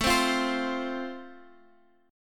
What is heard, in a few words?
A Minor Sharp 5th, Guitar, Drop D